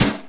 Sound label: Car Crash